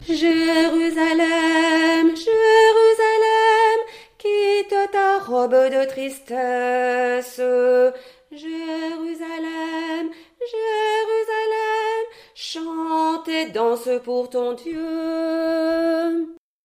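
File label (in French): Mariage Debout B, chœur; Basse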